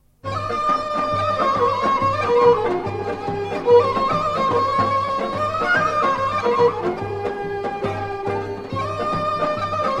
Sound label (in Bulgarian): Staro Erkecko Horo, Bulgarie